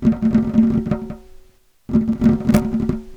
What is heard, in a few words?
drum